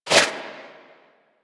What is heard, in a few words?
Tengu turbulence deflect; Warframe